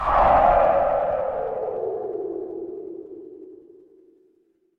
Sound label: magic scifi laser